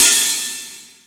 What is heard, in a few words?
More Cymbals, Drum Machines E-MU Pro-Cussion